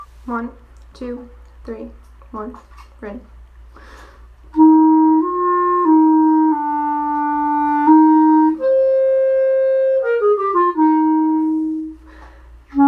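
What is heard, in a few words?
trombone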